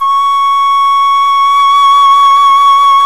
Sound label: Flute; CMI